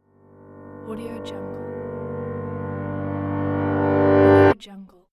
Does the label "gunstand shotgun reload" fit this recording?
No